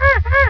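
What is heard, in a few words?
gull; moewe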